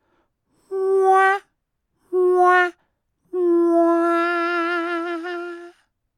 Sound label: Fail Jingle